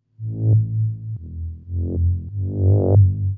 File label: SNTH BASS TEKNO 140 A